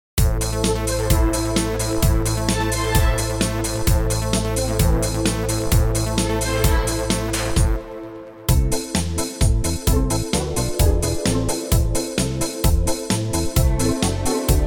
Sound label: modern piano minus